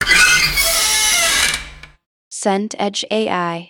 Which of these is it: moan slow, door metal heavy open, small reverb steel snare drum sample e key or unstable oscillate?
door metal heavy open